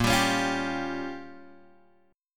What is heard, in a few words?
A sharp Minor 6th, Guitar, Open D